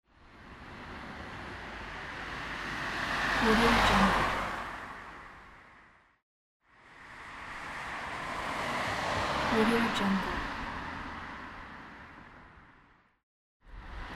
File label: car drive by